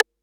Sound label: 808 RIMSHOT; Concrete Collage Drum Kit; Concrete-808